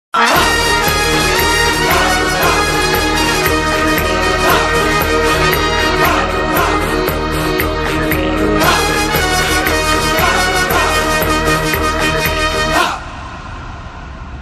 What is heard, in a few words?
bgm ringtone; malaikottai valiban